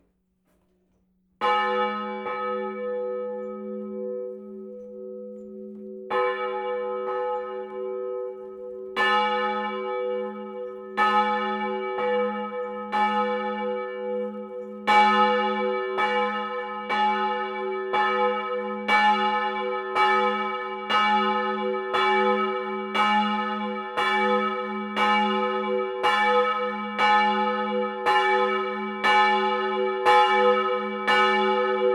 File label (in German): Glocke